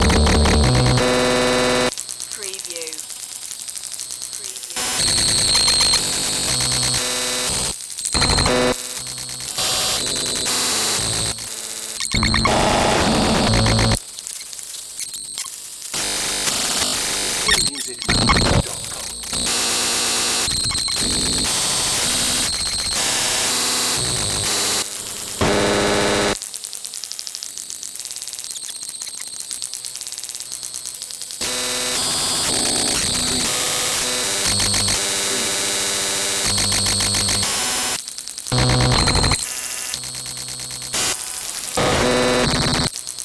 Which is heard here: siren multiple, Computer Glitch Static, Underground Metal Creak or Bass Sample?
Computer Glitch Static